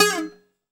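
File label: bouzouki glissando down G 3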